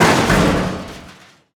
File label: explosive objects; World of Tanks